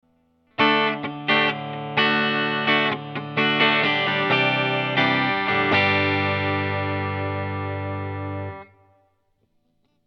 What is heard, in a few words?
clean